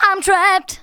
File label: VOCALS SUNG; Techno Trance Essentials